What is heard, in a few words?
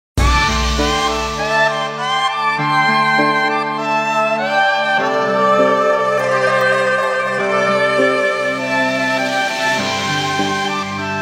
Nokia Chinese ring